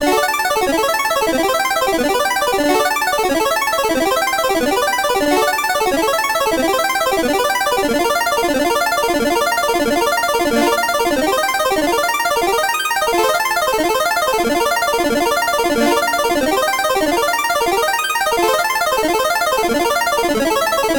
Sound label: win Free Spins; netent; reelrush